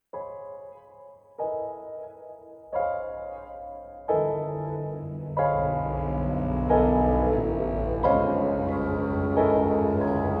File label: cutscene music